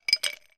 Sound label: food drink ice cubes movement in glass